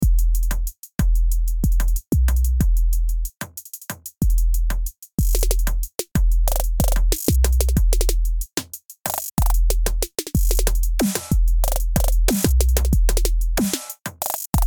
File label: BT Trap